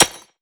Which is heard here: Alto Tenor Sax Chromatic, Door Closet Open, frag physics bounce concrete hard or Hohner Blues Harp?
frag physics bounce concrete hard